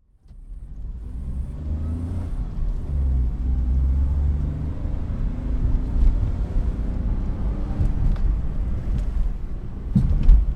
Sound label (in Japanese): kuruma no hashiru oto; Chino Cultural Complex